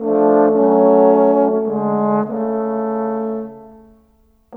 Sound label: Cinematic Trombones; Sonic Foundry (Sony Creative Software); Orchestral Series VOL-3 - Cinematic